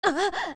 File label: Young Link Gasp; OOT; Zelda